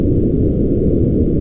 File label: quake; GZDoom